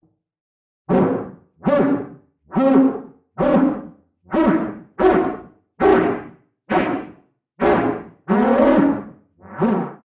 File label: barking